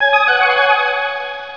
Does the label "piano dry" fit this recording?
No